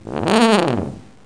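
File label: elephant; Microsoft MultiMedia Pack for Windows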